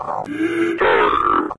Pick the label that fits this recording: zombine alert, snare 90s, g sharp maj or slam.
zombine alert